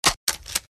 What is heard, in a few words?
glock Sound